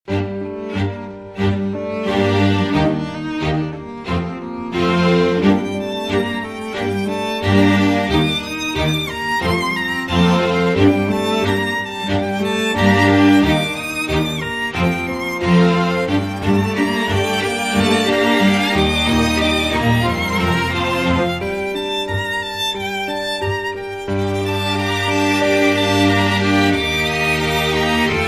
Theme In G Minor, No Drums

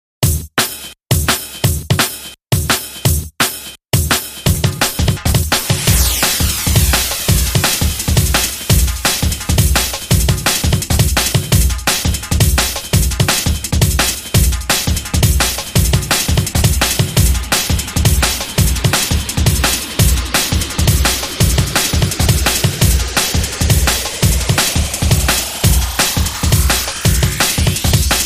Beat

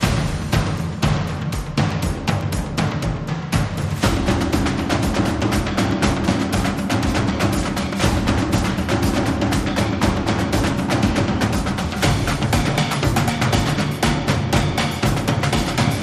Beat The Drums

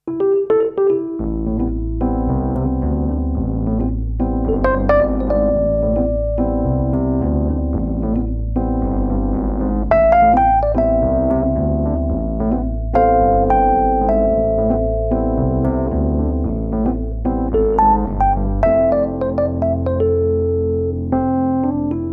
Rhodes

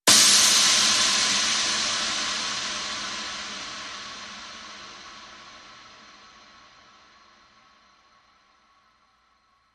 Cym Compression

